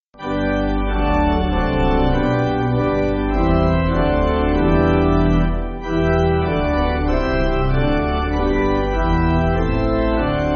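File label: Pipe Organ, church backing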